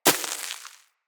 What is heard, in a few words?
Bullet Impact Debris Snow; Weapons